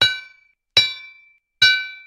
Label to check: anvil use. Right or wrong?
Right